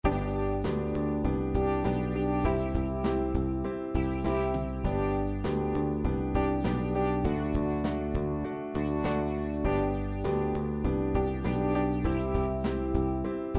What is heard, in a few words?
Bandpattern Rock; Popularmusik